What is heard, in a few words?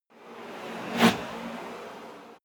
fly by electronic soft fast